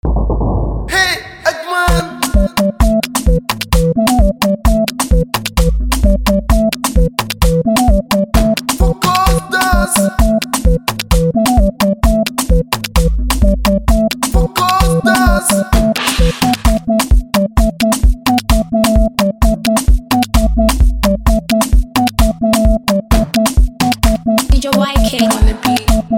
Beat

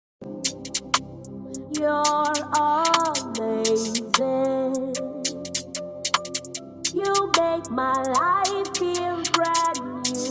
Drill Version

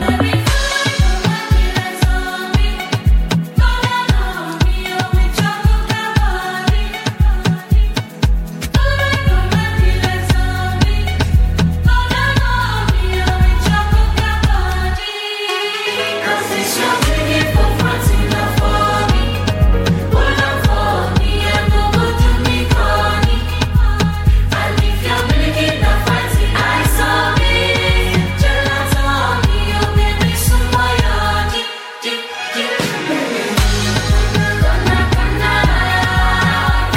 Choir

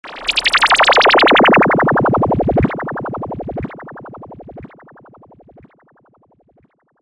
Lasser Sound

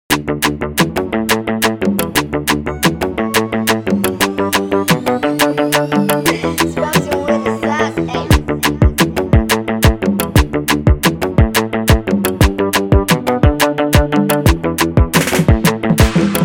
Instrumental

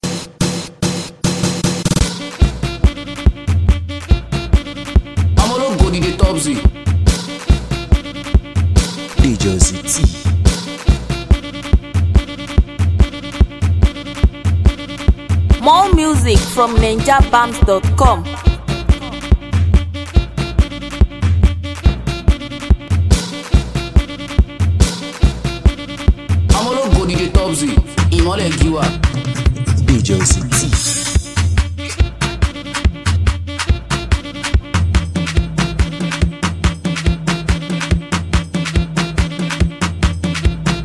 Cruise Beat

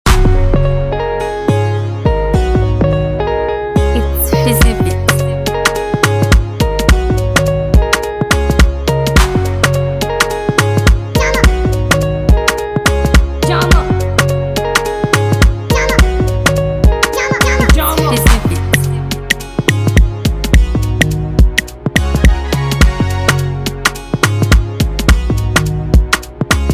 Instrumental

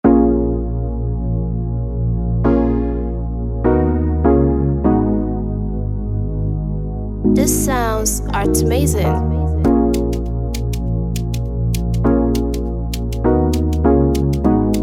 Instrumental

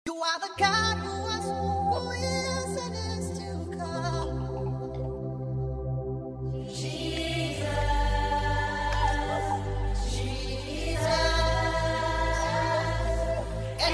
Drill Remix

